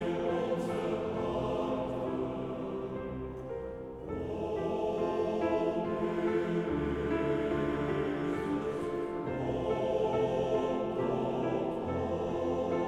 Koor mannen

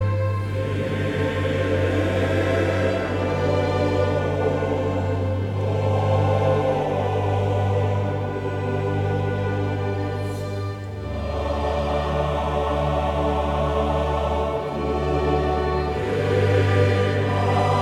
Koor (mannen); Westlands

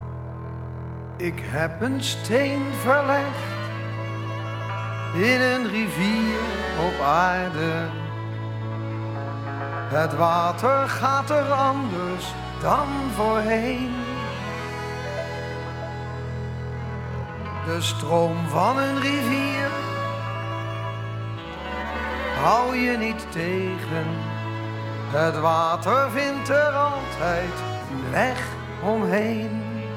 met panfluit

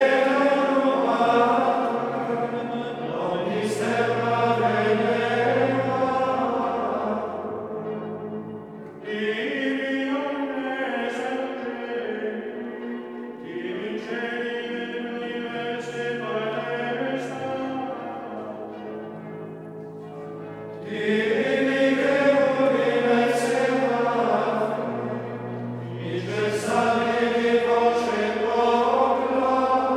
Koor (mannen) Gregoriaans; Te Deum

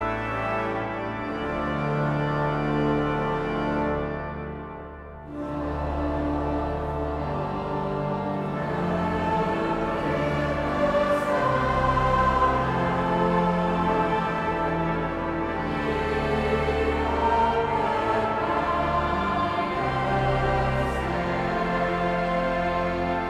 Koor gemengd

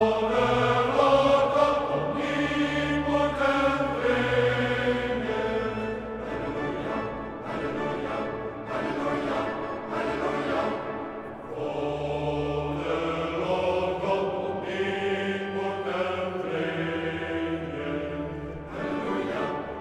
Koor mannen; Mastreechter Staar

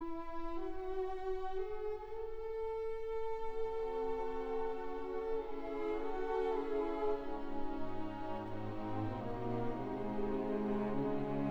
Romance pianoconcert

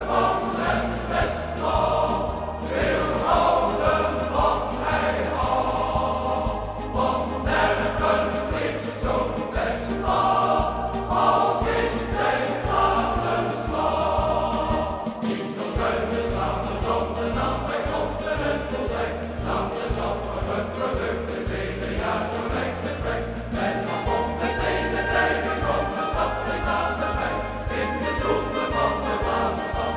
Koor

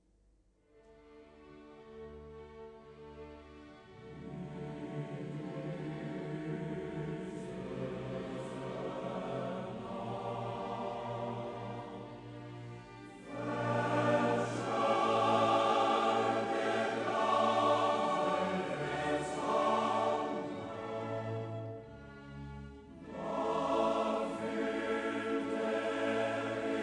Koor (mannen); Mastreechter Staar; Priesterkoor uit de Zauberflöte

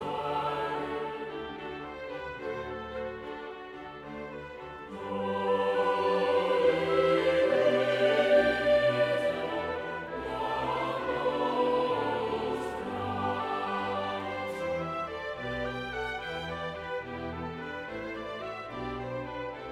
Koor gemengd

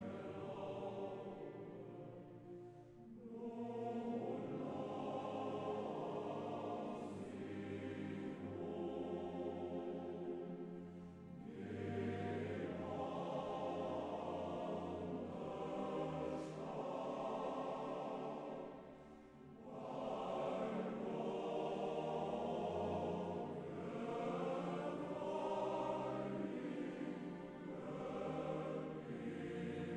Koor mannen Pelgrimskoor; Mastreechter Staar; uit Tannhauser

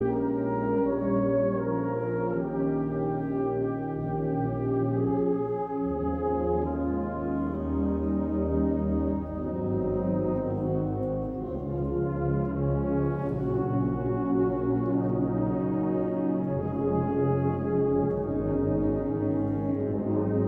Trompetterkorps; Nimrod

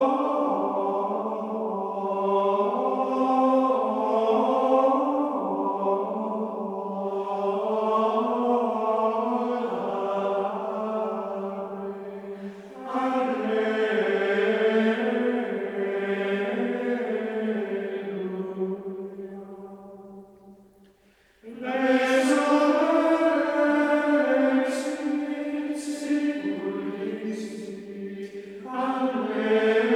Koor (mannen) Gregoriaans; Regina caeli

